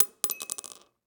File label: Pill Drop Glass; Medical; Blastwave